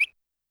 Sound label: Tweet, YAMAHA RM1X